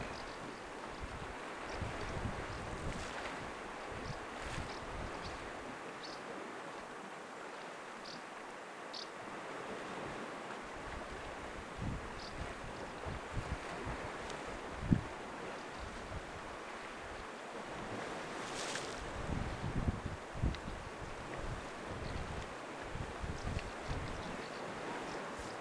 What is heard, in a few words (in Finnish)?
raystaspaaskynen lentelee lintutornin lahella